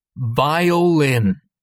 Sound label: violin